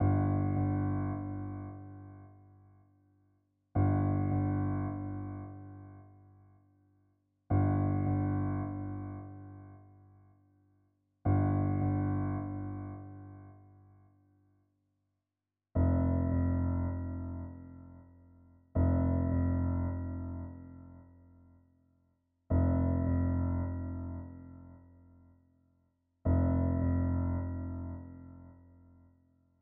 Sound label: piano